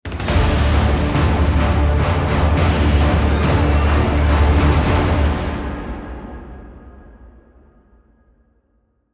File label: Transition